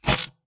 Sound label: bt footstep jog parts; Titanfall 2